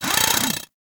Ghoul Drill Punch; Warframe; Grineer Ghouls; Ghoul Drill Sergeant

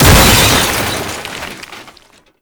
Throne Destroyed; Roathe; Warframe; Tau

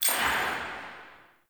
Vendor Buff Menu Hover; Tau; Circles Of Hell

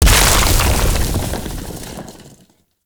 Glacier Ice Barrier Explosion; Gameplay; Warframe; Venus Open World

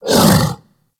Kubrow Tame Sleep Idle Wake D; Warframe

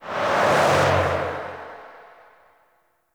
Reaper Travel Whoosh Right; Warframe; Wraith

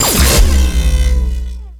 Mine Activate; Warframe; Venus; Venus Commander Orange; Abilities

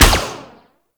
Minigun Shot; Warframe; Corpus

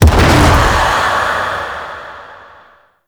Void Dragon Orb Proj Explosion Poison; Enemies; Abilities; Warframe; Duviri

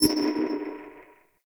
Warp Waypoint Pulse; Gameplay; Warframe; Chimera Prologue

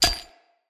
Dendrit Staff Caster Foley Grab; Weapons; Staves

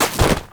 Deployable Cover Destroy; Gameplay; Warframe; Tau; Destructible Dynamic

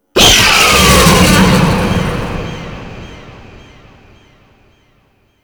Vay Hek Frame Jump Attack Roar, Vocals; Warframe

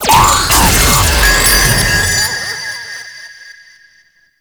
Enemy Teleport In; Warframe; Follie Frame; Abilities

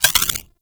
Freeze Ray Mech Chamber; Warframe; Corpus

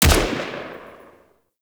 Fire Shot, Weapons; Warframe; Braton Vandal Redux

